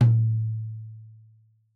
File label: Tom2 Stereo, Deeply Sampled Drums; SMDrum